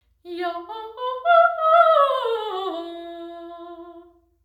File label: main level sing, creswell crags